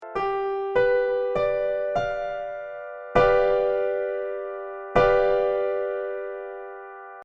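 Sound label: Guitar G7 piano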